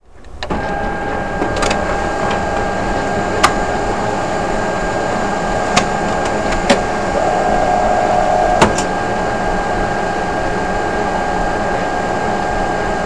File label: copier